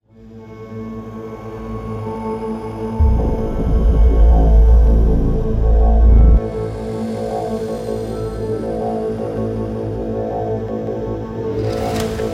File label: Tension